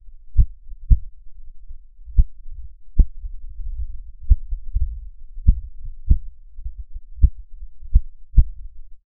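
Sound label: distant wind light heartbeat, thump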